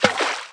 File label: Splash